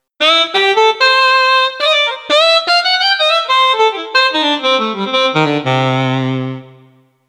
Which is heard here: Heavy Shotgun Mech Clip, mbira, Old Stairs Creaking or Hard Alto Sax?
Hard Alto Sax